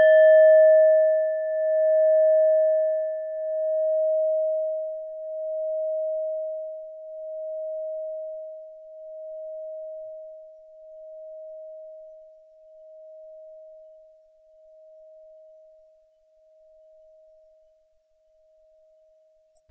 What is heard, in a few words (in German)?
klangschale nepal